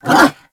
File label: Kubrow Tame Bark